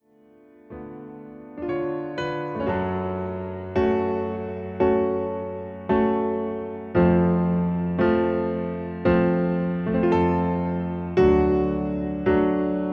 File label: Piano